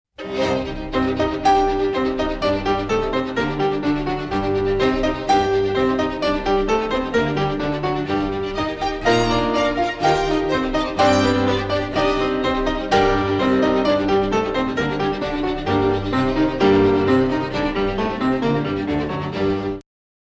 quintet; schumann

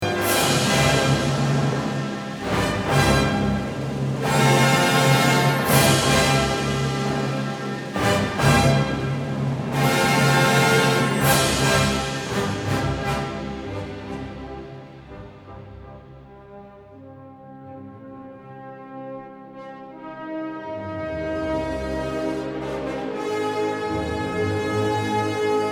Funeral March; Wagner; Siegfrieds Death

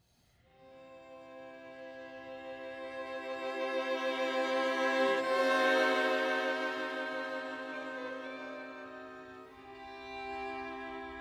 quintet C; schubert

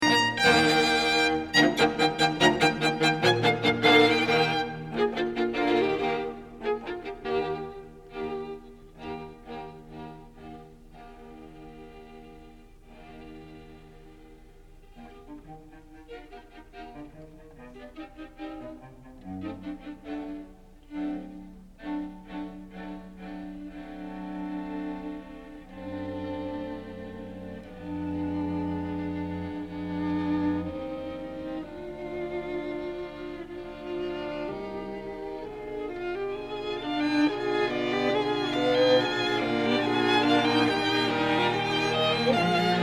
String Quartet example; Beethoven; String Quartet no10

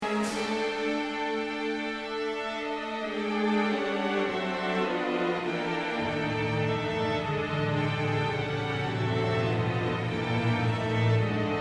Music for Strings climax; Bartok

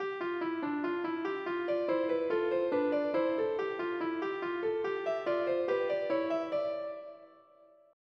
C-Major Invention; Bach

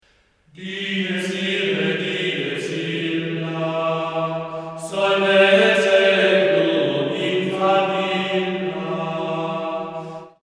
Gregorian

